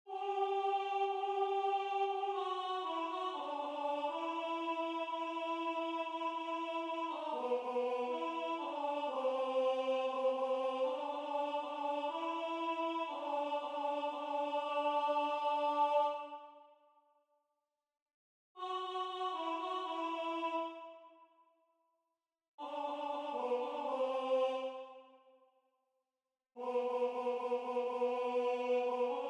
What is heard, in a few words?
Alto; A Toi puissance et gloire